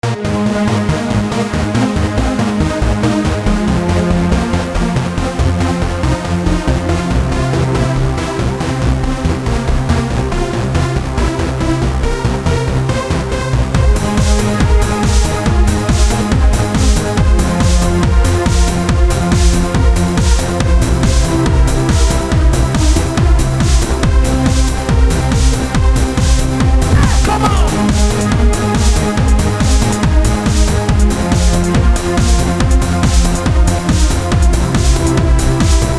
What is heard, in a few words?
Synth Version; Sonic 1 Lunacy - No level yet